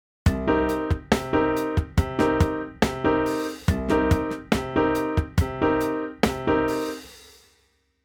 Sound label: funk piano rhythm chords